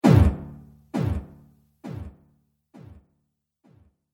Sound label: Excited Impact Slow Echoes, impact sound effect; Excited Impact - Medium Echoes; Miscellaneous Impact Sound Effects; Andrew Fleming